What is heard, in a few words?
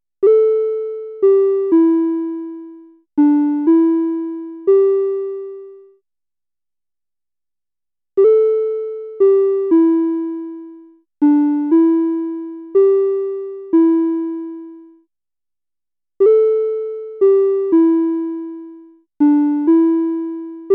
Guitar